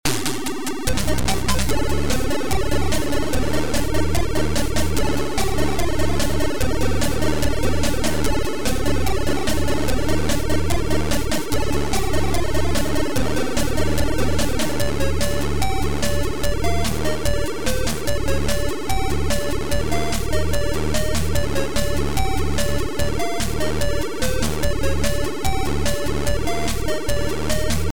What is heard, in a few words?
Soft Rattle